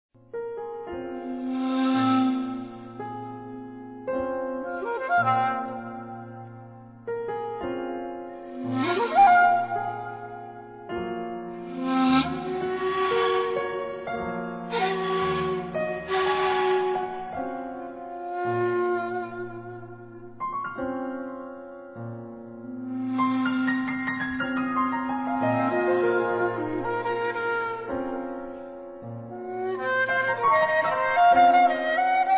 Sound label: locrian; locrian demo